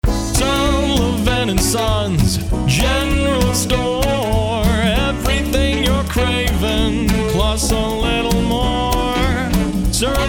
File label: Jingle; WXRR; Sullivan and Sons